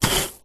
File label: glass pieces thousands small broken wood dig in plastic tub; foley; zapsplat